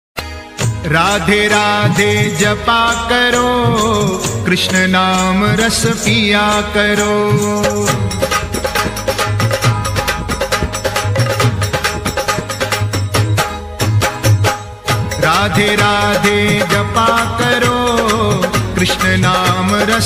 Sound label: Radhe Radhe japa karo Ringtone, Bhakti Ringtone Hindi Ringtone